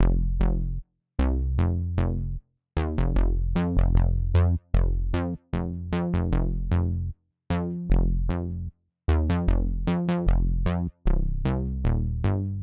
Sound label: 808 bass line, 76 bpm